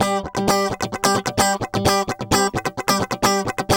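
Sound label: guitar, 130 BPM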